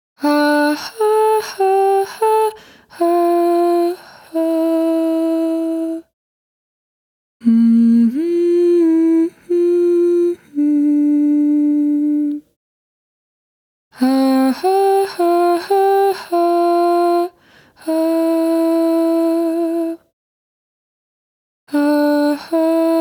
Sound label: Intro Cue Vocals A; Lotus Eater